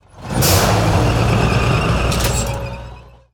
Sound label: Vehicle Tank Underlay